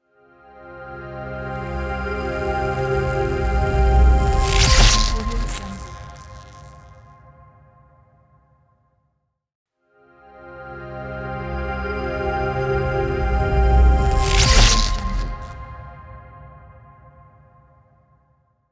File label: short electric logo